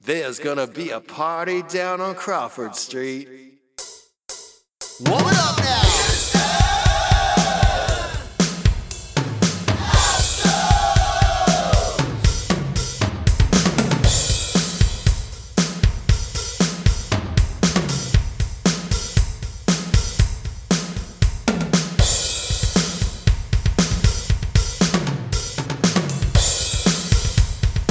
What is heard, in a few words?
vocals announcing crowd